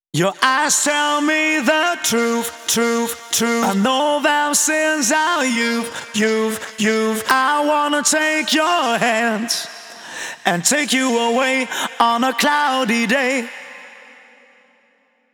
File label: Male Vocals Vers2 WET, 140 BPM; Vengeance Samples; Song Kits; One Second